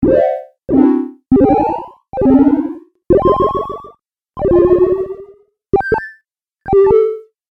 Classic Lo-fi Magic Sound Collection, video game sound effects